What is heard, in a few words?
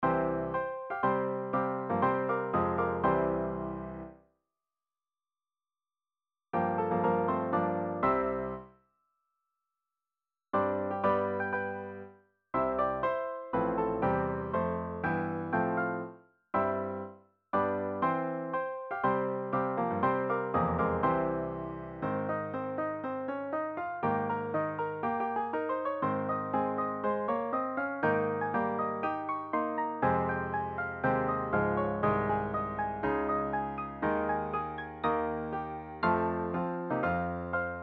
Choral Audition Accompaniment Only